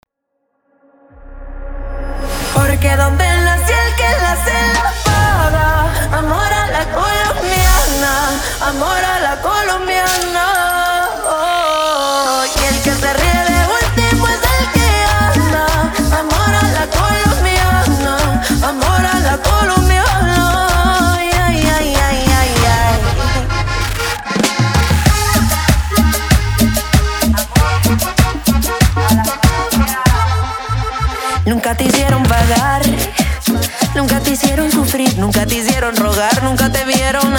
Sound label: Acapella 95 BPM; DJ Ax Pro, A Colombian talented Dj